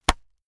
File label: plastic bag burst small pad